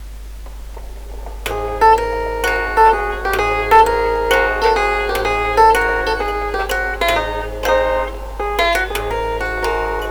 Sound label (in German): Chordephon